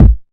tight low end kickdrum a key, Royality free kick drum sound tuned to the A note; kick drum samples